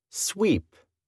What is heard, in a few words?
sweep